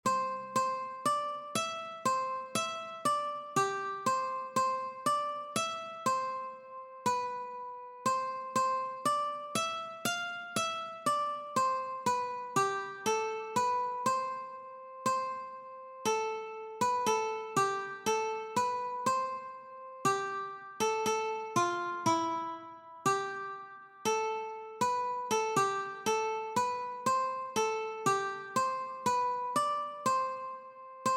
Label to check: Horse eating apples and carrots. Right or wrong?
Wrong